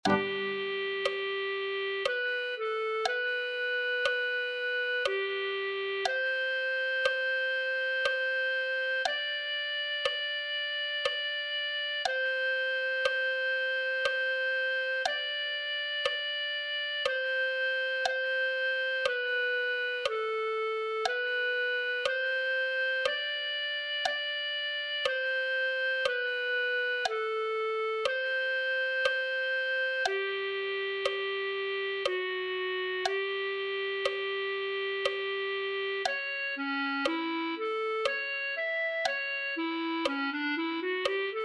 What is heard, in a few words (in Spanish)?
clarinete